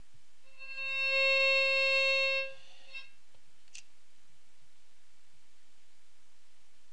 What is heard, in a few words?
viol